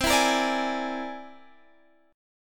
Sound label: C Augmented 9th, Guitar, Half Step Down